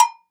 Cowb Jam, Roland SPD-S

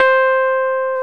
PIA, E-PIANOS MKS-20

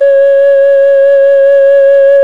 OCARINA, Exotic Winds

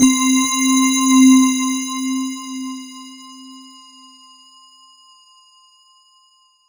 C3, SynthLine

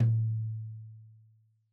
Tom2 Stereo, Deeply Sampled Drums

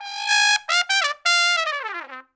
Trumpet Noise; Sonic Foundry (Sony Creative Software); Jazz Solos and Sections

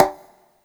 Perc, retro house samples, Drum Hits

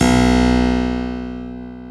KEY HRPCR; Roland - LA Composer vol. 1

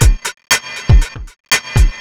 Machine, Drums Beats

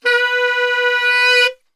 B2 sax

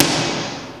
KRACKER; Rubber Chicken Software Sample Bank; DRUMS + PERC

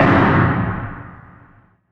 loop; X-Static Goldmine 3

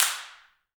handclaps, Heart of Africa

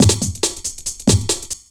loop, Drum & Bass, 140 BPM; Ueberschall; Drum & Bass Resonance

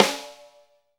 SD, Real Kit; 90 s Sample CDs; Sound & Vision - Gigapack I CD 1 (Roland); Real-Kit m11

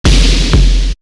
Drum, Drum One Shots; AMG - Coldcut's Kleptomania VOL-1

